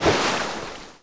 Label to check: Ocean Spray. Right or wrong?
Right